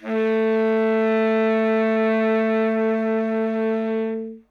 notes a, sax; Dirt-Samples

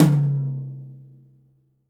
hi tom, gretsch; Dirt-Samples

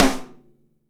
snare hard, gretsch; Dirt-Samples

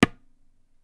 tabla; Dirt-Samples

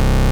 juno raw low

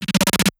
noisey run

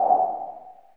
wind

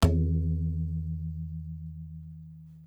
tabla; bahia; Dirt-Samples

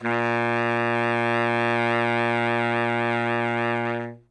notes b flat, sax; Dirt-Samples

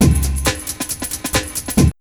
break

